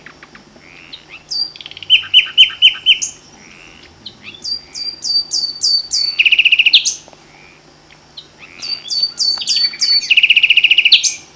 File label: hoopoe oboe birdsong; On the plains of Spain ... a summer migrant from Africa ... looking for a mate; noise of XM2